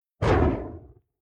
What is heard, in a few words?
Whoosh Fireball Whip Fire Away Flame Heavy Doppler; Title Graphics; Tom Hutchings